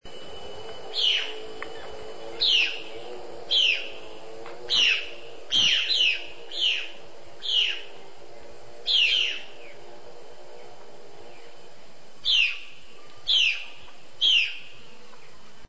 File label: violaceous jay; Peru